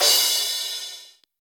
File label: large room crash one shot d key, crash sound tuned to the D note